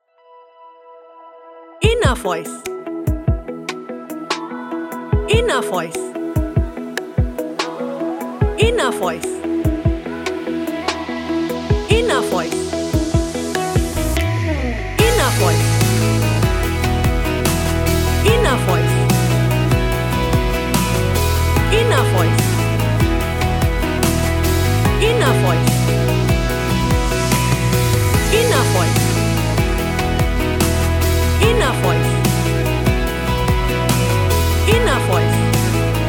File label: rise to the top